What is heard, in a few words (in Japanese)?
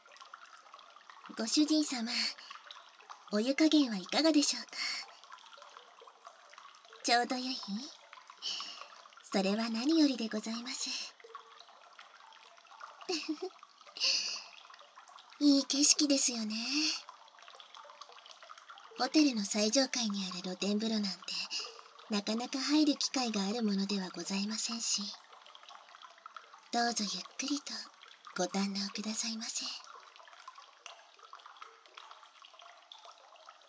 お風呂で混浴（バイノーラルマイク収録 効果音あり）